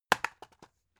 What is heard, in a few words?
drug package drop deep hard; berufe; arzt